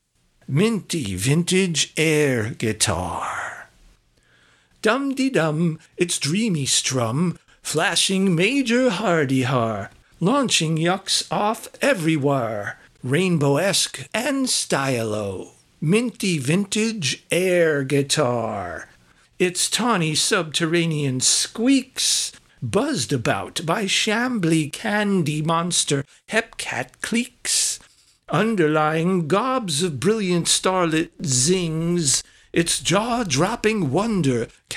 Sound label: vintage air guitar